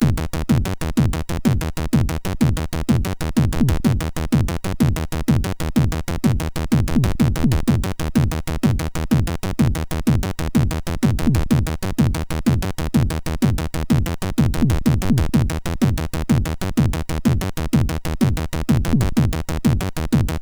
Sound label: Techno, MOS6581R4; Zool